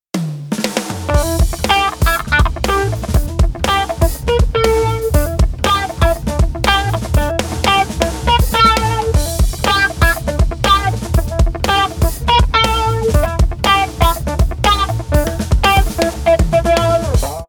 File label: post grunge